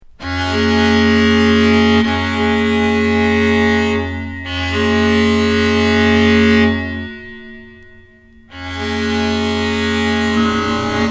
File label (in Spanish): musica electroacustica espanola